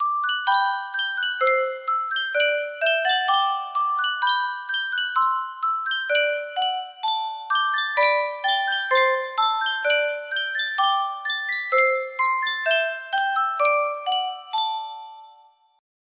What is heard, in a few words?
yunsheng music box, music box melody